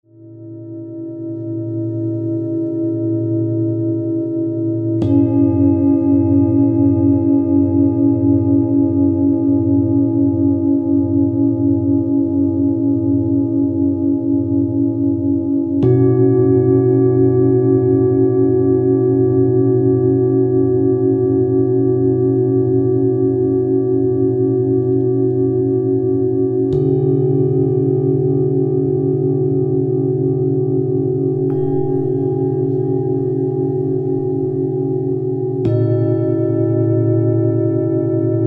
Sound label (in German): Klangschalen Träume; Die Schwingungen von Feuer, Erde, Luft und Wasser genießen